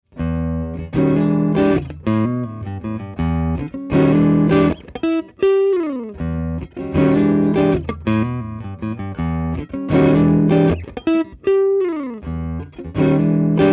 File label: Distance Elec Gtr Cls